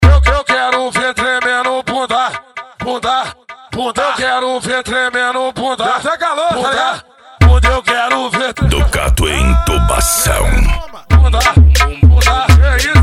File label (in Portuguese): funk agressivo